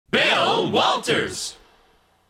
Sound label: Scream